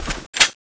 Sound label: stickybomb pull pin